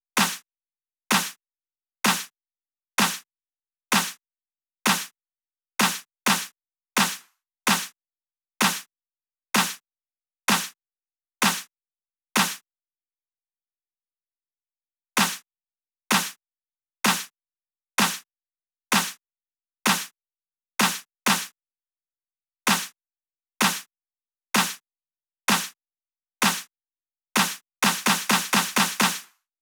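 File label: 128BPM Snare; Demo Constr Kits; Early Morning Kit Root G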